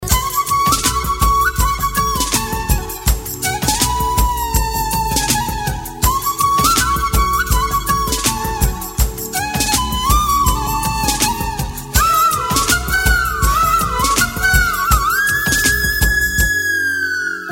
flute

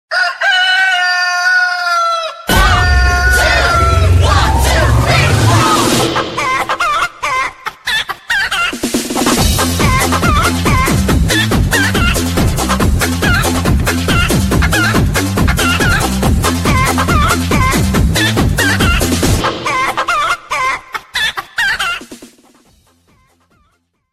Kukdu Ku, Hen, Funny Song Ringtone

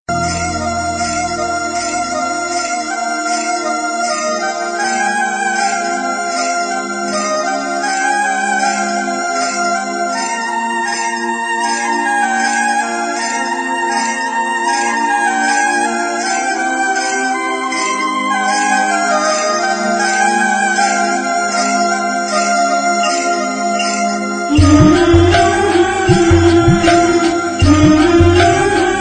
ringtone; Major Saab

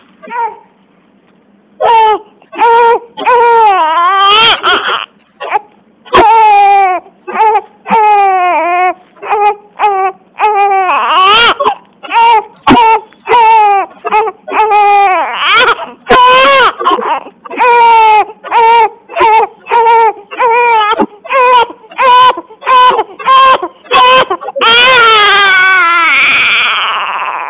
infant cry

